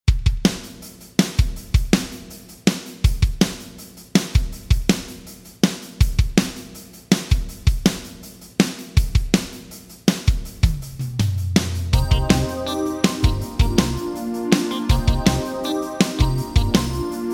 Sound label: Drums Sony MU R201 Plate; Plate 31; Vintage Digital